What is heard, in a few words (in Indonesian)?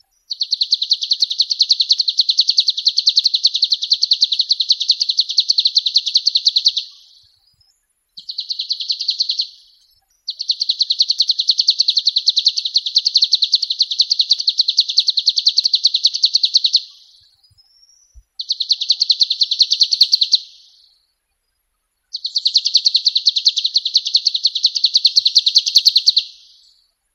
ciu